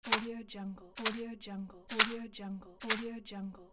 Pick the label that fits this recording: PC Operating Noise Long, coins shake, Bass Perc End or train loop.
coins shake